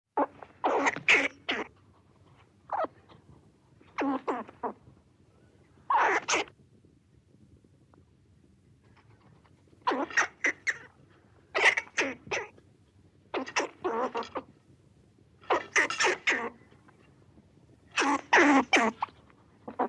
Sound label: forest squirrel; veverky obecne